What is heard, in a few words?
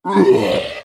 zombie, infected; gesource